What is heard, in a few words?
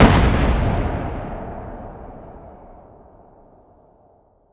Explosion Gasbag; War Ambience; Prydwen